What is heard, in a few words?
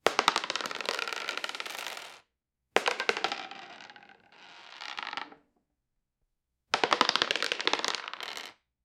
multiple polaroid portrai; photography